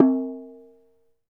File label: CLAY, CAMEROON; AKAI S6000 CD-ROM - WorldSounds; CAMEROON PRC